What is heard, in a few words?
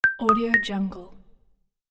interface confirm download